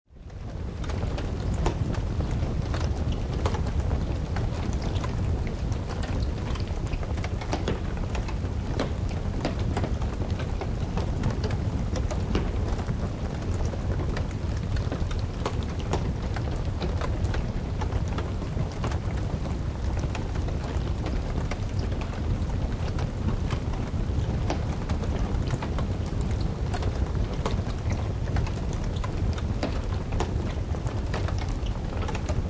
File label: Fire General Fire, Continuous fire burning in building or vehicle, general fire crackling and po; Flame Sound Effects